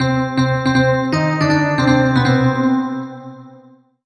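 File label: Solo Electric Piano Negative; MUSIC EFFECT; startide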